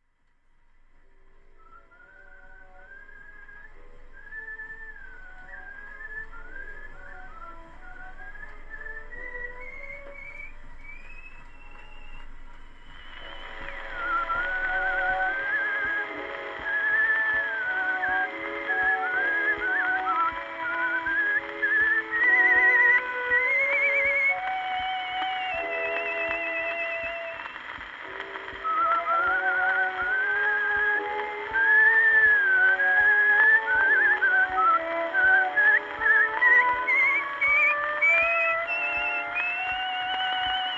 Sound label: Waltz